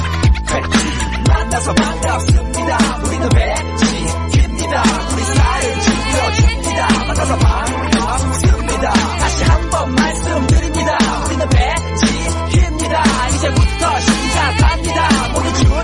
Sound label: Tik Tok Song Ringtone